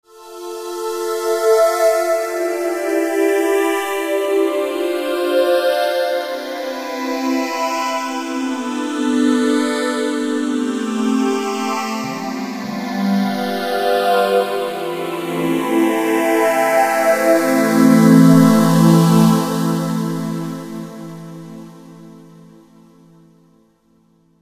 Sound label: Phasing